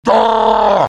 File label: eyeball mad; vo; tf2; garrysmod; halloween eyeball